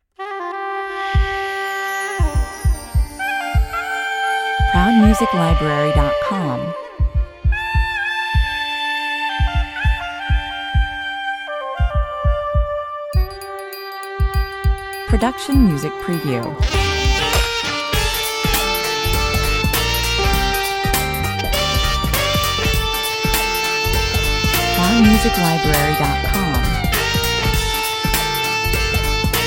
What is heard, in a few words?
a police story 100 bpm